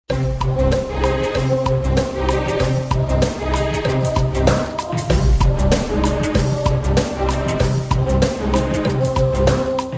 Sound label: In Game Music (10 Second Countdown); Kahoot